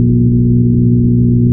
transformer hum; ambient

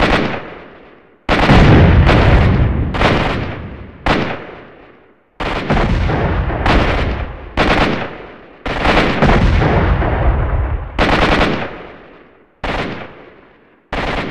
battle

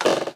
floor creak

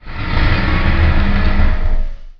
move, ambient

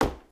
wood, human step

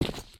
step

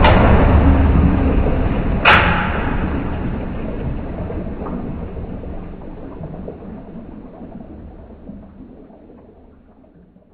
engine stop, ambient

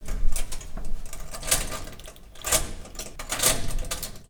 lock sound

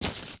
step gravel

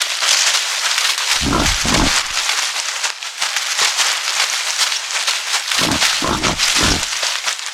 boar swamp run

